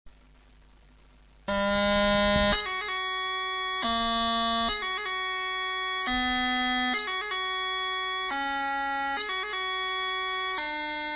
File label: f doublings, bagpipes